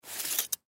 Snow Knife Pick Up Blade In Snow; Knives; Combat; Weather; Blastwave

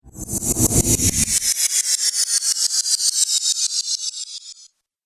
Stutter Whoosh

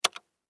Technology Computer Keyboard Enter Button; Typing; Offices; SFX Bible

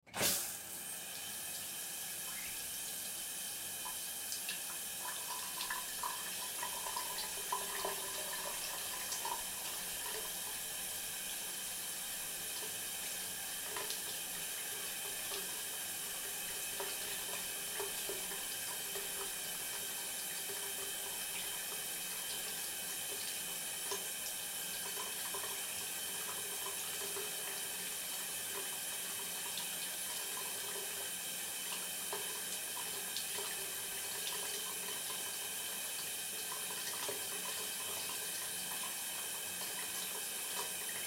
Bathroom Shower, Shower Turn On Run Off Drip; Household; Blastwave

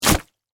Stab Flesh Large; Horror; Blood and Guts

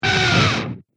Scream Total Distortion; Screaming Sound Effects

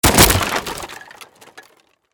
Crash Wood Smash; Materials; BlastWave

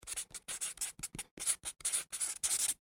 Office Marker Write On Cardboard Fast; Writing (Sound Effects:Human)

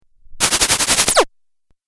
Laser Hiss Video Game

